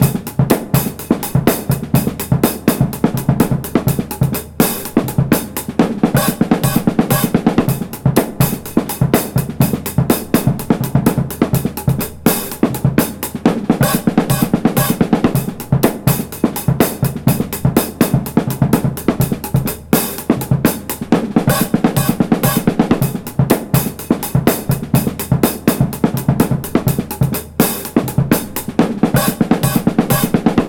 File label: 125 bpm, Breaks; CHILLI SAUCE; 90 s Sample CDs; Planet of the Breaks